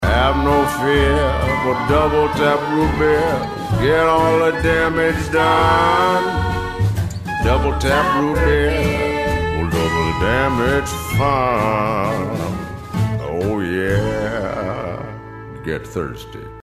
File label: double tap; cod zombies